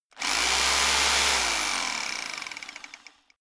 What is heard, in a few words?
rock drill chisel burst short; maschinen; schrauben drehen hydraulik